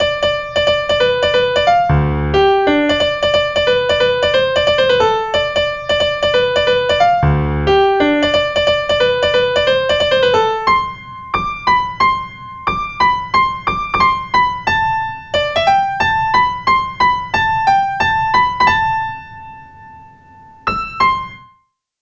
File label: Dances with Lizards Piano Redux